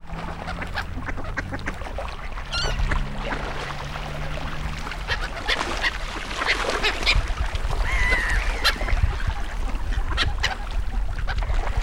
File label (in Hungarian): sirályok és kacsák